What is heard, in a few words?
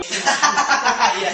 Laugh; Harry Styles